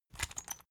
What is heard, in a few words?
salt mill squeak